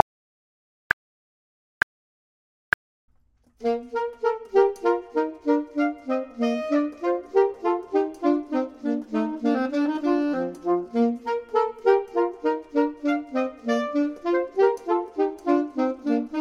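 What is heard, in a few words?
both, Saxophone and Clarinet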